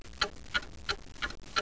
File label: clock